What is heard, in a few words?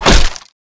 material wood; scarlet; slender